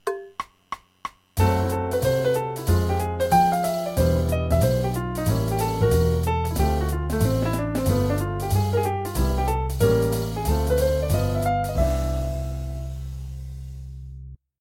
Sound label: IVm7b5 IVm, jazz piano